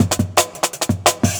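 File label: Jungle Breaks, 170 bpm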